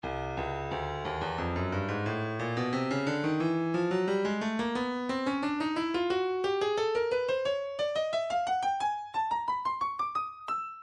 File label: breakbeat 089 bpm; Stylus Breakbeat Midi Files 085 089 Bpm; Bombadier B